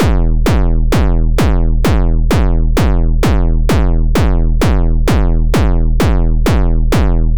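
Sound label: hardcore 130 BPM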